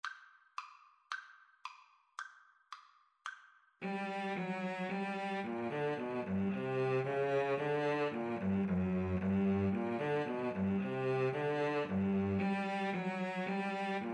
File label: Violin Cello Duet; Gallini, Giovanni Andrea; Les Plaisirs enchantès (No. 24 from 'A New Collection of Forty